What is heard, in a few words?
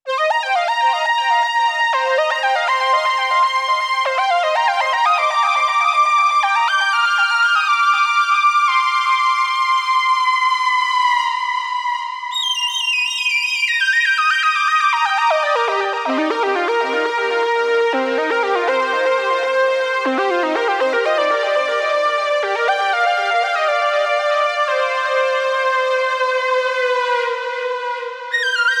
120 BPM C Min Lead; Melody Loops; Cymatics; ANALOG EVOLUTION Beta; Cyber Collection